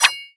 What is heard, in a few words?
throw, shuriken; weapons; garrysmod